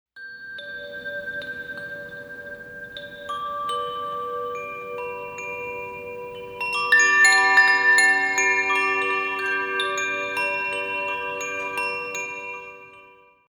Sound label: windchimes; julie ben semmane